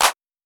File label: Clap